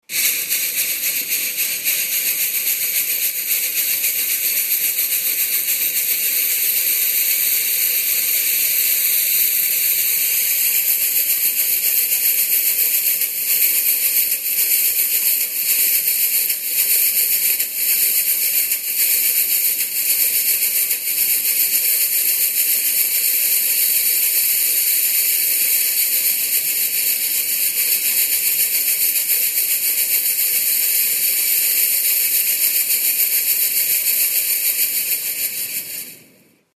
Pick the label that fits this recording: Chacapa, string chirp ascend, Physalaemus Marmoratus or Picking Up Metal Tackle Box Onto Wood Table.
Chacapa